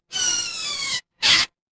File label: cartoon squeak